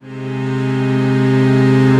clean natural, acoustic

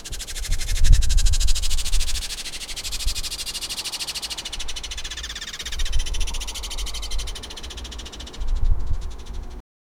squirrels or raccoons on, attic

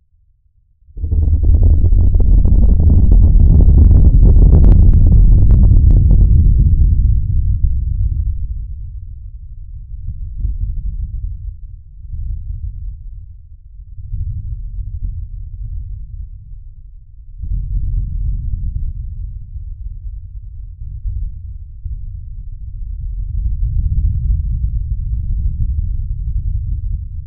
a deep low frequency volcano

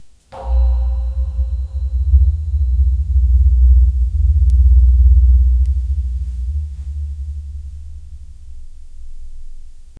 soft ceremonial throne ha

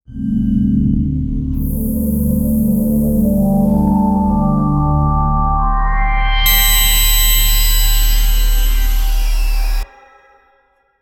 broadcast news intro sound; media

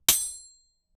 pickaxe breaking after hi; tool

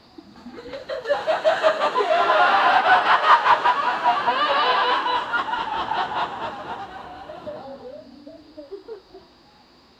a noisy ambient sound, outdoor

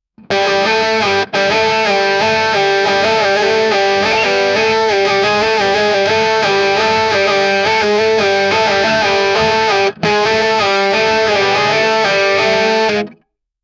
rock guitar sound, vinyl; rock guitar sound recorde

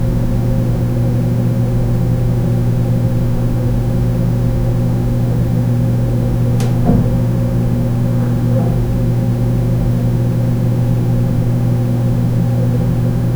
dorm room ambience, bubbling

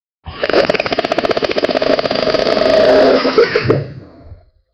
a rubberhose cartoon styl; obese

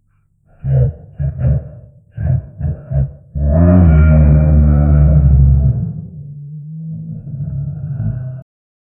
the sounds of a beast, prison

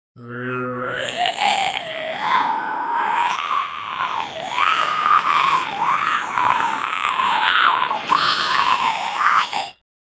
zombie outbreak alert

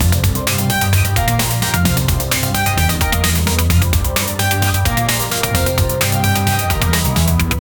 arabic music; middle eastern

